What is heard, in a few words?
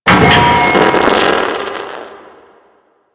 Platform Fall; Warframe; Ambience; Tenno Challenges